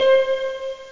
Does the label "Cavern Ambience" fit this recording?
No